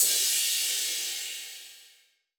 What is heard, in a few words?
Hi-Hat Heavy, Electronic Drum Samples; E-Punk Project Drum Samples